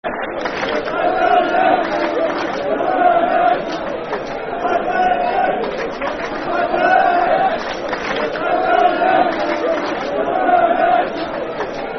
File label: Hibernian (Clap) Football Chant (Soccer Song)